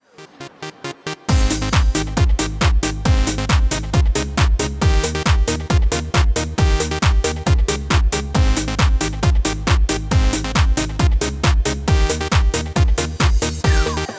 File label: music amb